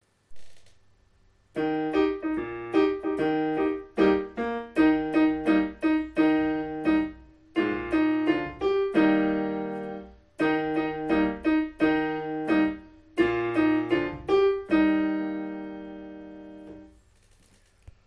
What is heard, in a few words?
piano; tragoudi gnorimias